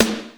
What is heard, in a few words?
old school loud snare sound a key, Royality free snare drum tuned to the A note.; snare-drum-samples